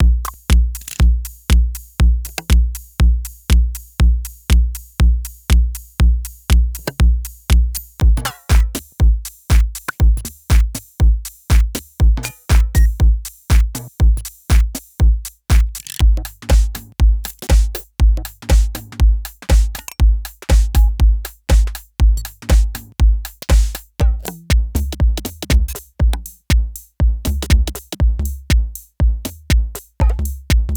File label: analog deep house